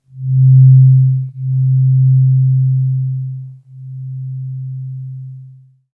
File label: C2, Synth Line